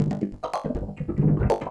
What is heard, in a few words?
Industrial 140